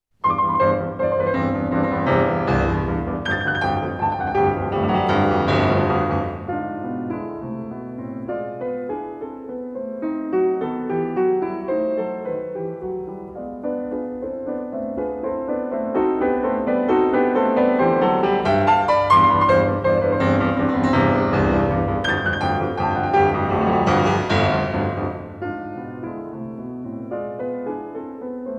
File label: Waltz in C major, Allegro con brio; Ludwig van Beethoven - Complete Works [Brilliant Classics 100 CD Box]; Piano Variations IV; 33 Variations on a Waltz by Diabelli in C major Op. 120